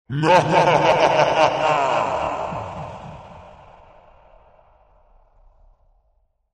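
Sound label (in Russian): зло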